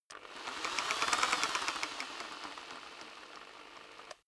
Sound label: rock drill hammer rev very long soft; maschinen; bohrer; soundpool